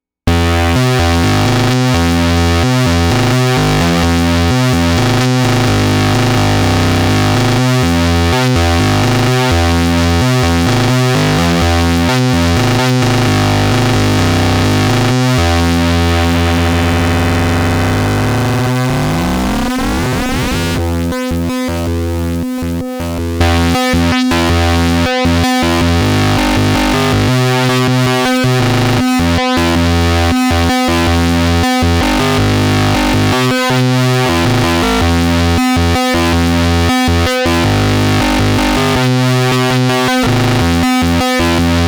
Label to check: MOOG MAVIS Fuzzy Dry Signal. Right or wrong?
Right